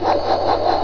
down; Bloobs

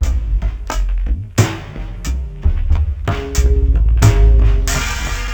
DRUM

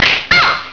SLAP

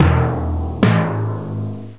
TIMPANI

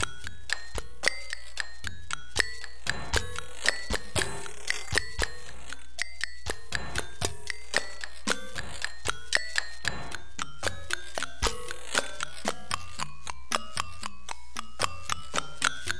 MACHINE

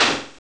Stone; Future

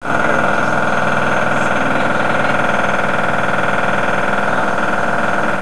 JACKHAM

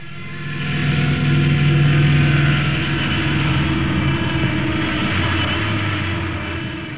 DIVE

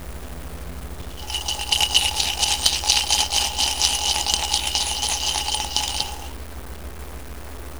CHNG CUP

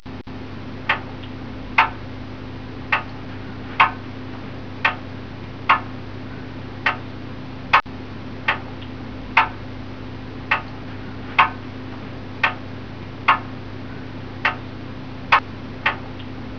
TICK TACK

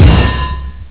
transfer start; ParadroidII

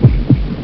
heartbeat; alienfrenzy

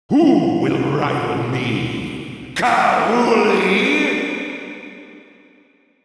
BEAR; KRONDOR

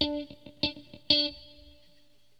GUITAR, ROCK POP; MAGIX

